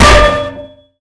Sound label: railgun; Weapons; EK modern; Barotrauma